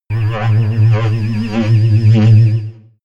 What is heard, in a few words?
alienation